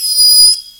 atmo; Techno Trance Essentials; FX & ATMO

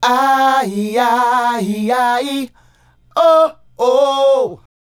Male Group, Vocal; Sonic Foundry (Sony Creative Software); Vocal Toolbox

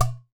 GHATAM, DRUMS OF IND; AKAI S6000 CD-ROM; WorldSounds

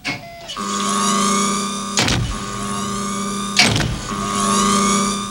HYDRAUL; Northstar; The Wizard VOL-1

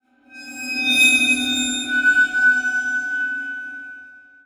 Waterphone Shine; Sonic Foundry (Sony Creative Software); The Electronic Music Manuscript Collection

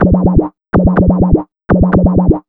125 DRM, Fresh Disco House

